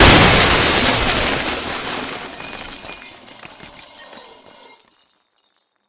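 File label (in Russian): продолжительный грохот упавшей посуды и мебели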